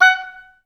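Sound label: oboes staccato F#4, orchestra; OBS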